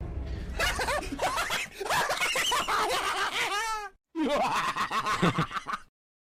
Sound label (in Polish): śmiech; Mammon